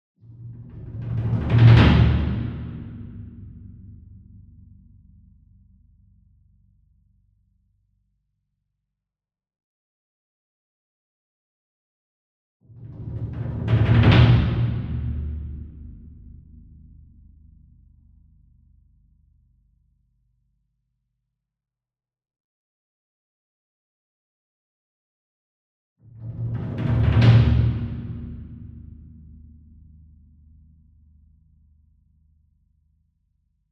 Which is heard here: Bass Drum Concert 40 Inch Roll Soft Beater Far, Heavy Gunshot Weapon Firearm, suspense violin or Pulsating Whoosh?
Bass Drum Concert 40 Inch Roll Soft Beater Far